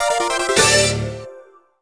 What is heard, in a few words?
player invite